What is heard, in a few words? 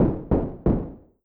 door knock iron synth